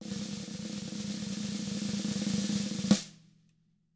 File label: ROLL, SNARE ROLLS; ILIO; Double Platinum Drums 1